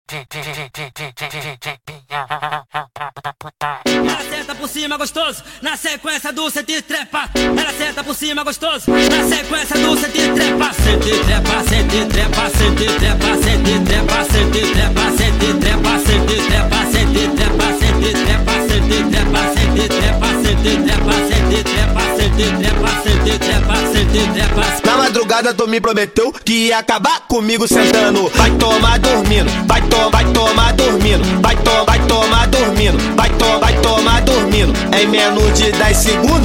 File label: Montagem Vozes Grito sped Up, funk